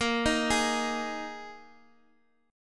A sharp 7th Suspended 4th A sharp

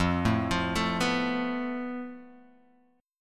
F Major Flat 5th Guitar Open D, chord; down; chord shape

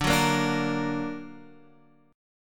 D Augmented D down Guitar Half Step Down

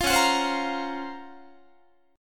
C sharp Major 13th, Guitar; C#M13 strummed; 20,21,21,22,21,21; JGuitar

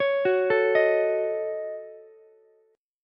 Eb Minor 7th Flat 5th, down, Ukulele; arpeggiated; chord; JGuitar

